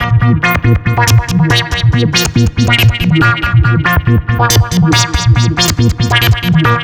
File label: NRG SFX, Trance